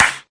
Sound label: CLAP, Volca; WallsVado Volca Sample Pack